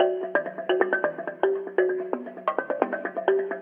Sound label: vibraphonicz, drum loops